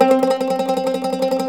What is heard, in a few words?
MANDOLIN, Tremol Mandolin; StTremolMandolin